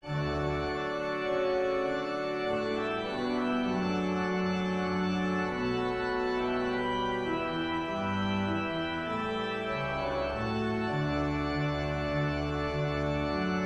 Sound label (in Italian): processional, trascrizione da concerto per grande organo; Water Music